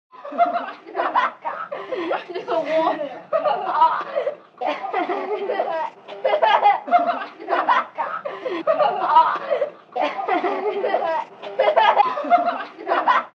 Children Play Laugh

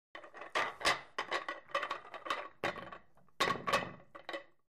Billiards Racking B

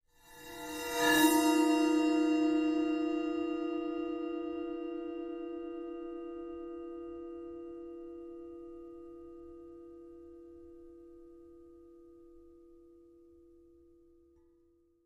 Splash 8 Cymbol Bow

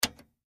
Push Button Plastic

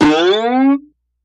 Boing Up; boings

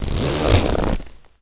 Denim Jean Zipper; zippers

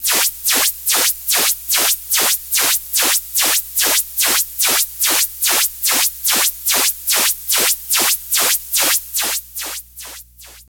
Oberheim Matrix Atm, sci fi ambience

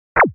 Laser Gun Blast Shrt; laser fx

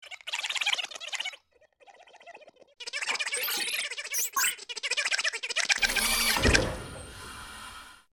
Weird Talking Device, cartoon gadget fx

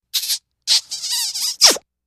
Kiss; kissing

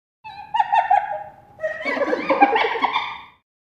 Hyena Spotted Laugh

